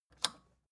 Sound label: record player power release off